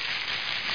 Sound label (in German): knistern